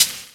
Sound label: Hat; 300 Drum Machines; E-MU MoPhatt